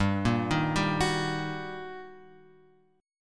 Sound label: G Minor Major 7th Sharp 5th, G; GmM7#5 Chord {3 1 1 0 X 2} arpeggiated